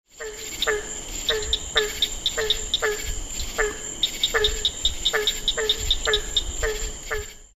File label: barking treefrog and cricket frog Pond; Rollercoaster Pond